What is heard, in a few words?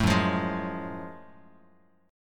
F sharp Minor Major 9th, Bass Standard; strummed